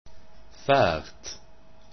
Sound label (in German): fahrt